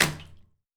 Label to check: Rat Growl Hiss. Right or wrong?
Wrong